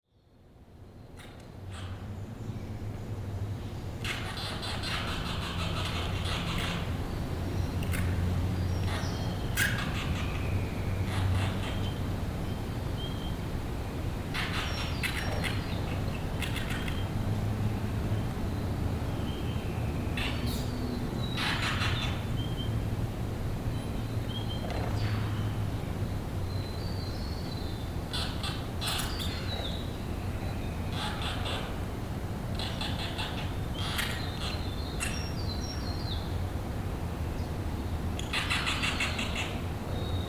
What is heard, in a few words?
Micro Bats Tunnel field recording; Dularcha Tunnel